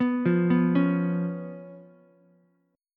B Suspended 2nd Flat 5th B down Ukulele; arpeggiated; JGuitar

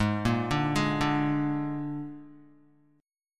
Ab Suspended 2nd Flat 5th Ab Guitar; Listen to Absus2b5 arpeggiated; JGuitar

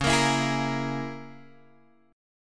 C sharp Minor 6th, Guitar, Drop D

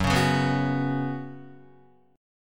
F sharp Suspended 4th, F sharp, Guitar; F#sus4; strummed; 1,4,1,4,1,2; 4F,4A,5C,5G,5C,6E

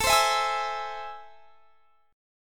A Minor Major 7th, down; AmM7; strummed

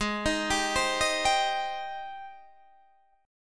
Ab Major 7th Ab down Guitar DADGAD

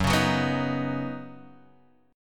D sharp Minor 7th Sharp 5th F sharp

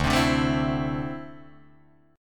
D 7th Suspended 2nd down Guitar Open D

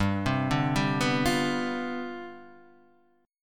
C Major 7th Suspended 2nd Suspended 4th, G, chord

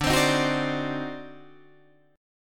D 7th Flat 9th D down Guitar Open D

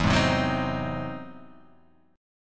E Augmented Major 9th; strummed; E+M9; JGuitar

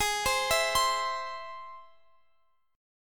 C Augmented, guitar chord

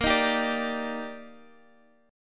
D sharp Major 7th A sharp

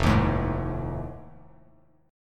F 7th Sharp 9th, Bass Standard; F7#9 strummed

